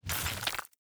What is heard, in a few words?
gold block destruction stone